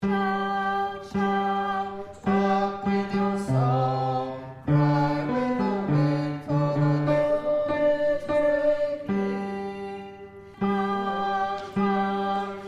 bass

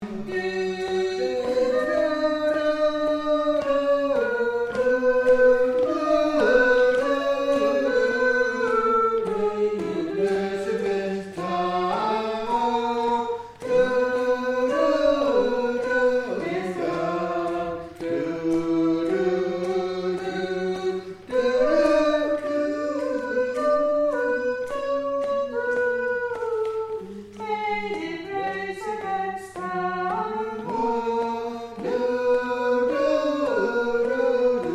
Tenor

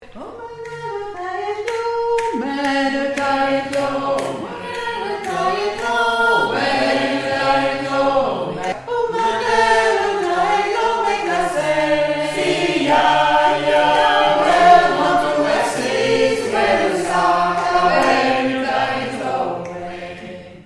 Bass Sop

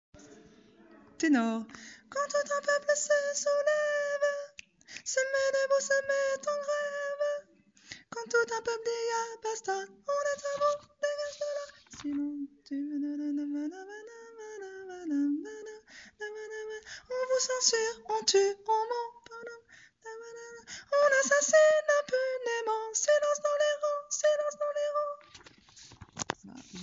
tenor

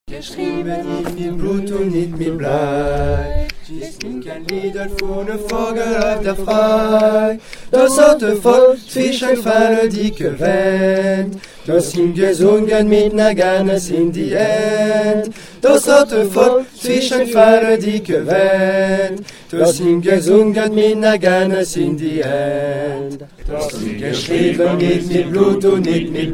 Men

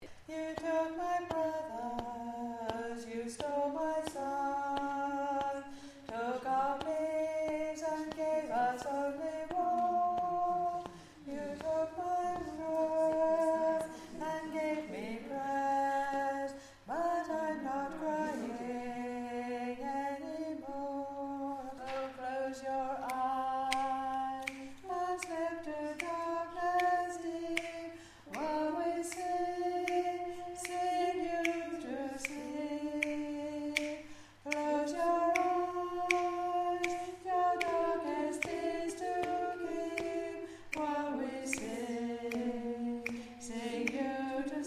Alto

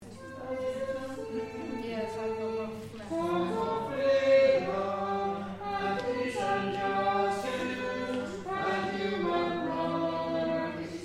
tenor

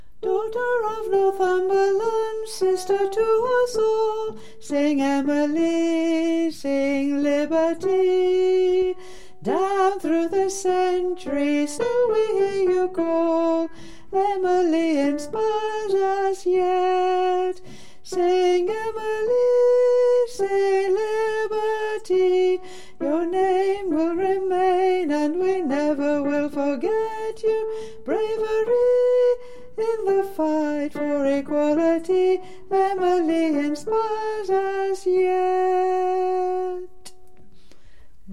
Emily Inspires Mid

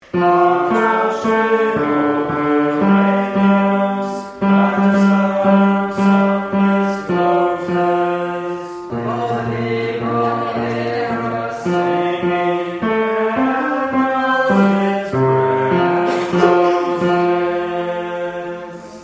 Tenor Bass; bread and roses

